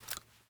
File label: double shotgun insert shell; Rust